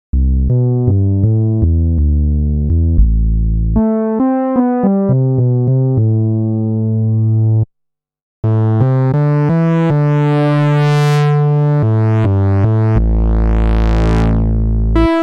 yamaha ex5r sounds fdsp seismic pad raw and seismic